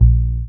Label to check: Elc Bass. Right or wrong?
Right